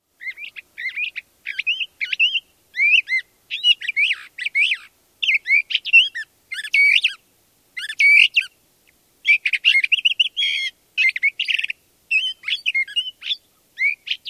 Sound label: song; AZ